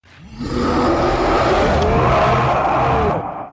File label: Roar; Hulk